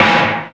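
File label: SD, snare drum, Dance-Kit; 90 s Sample CDs; Sound & Vision - Gigapack I CD 1 (Roland); Dance-Kit 13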